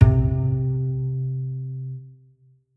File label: Bass Note; Bendy Lone Wolf